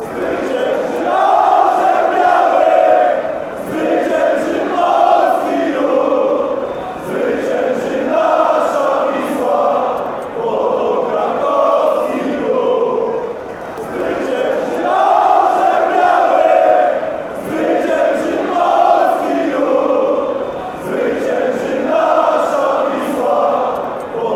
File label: white eagle will win, fanchants, poland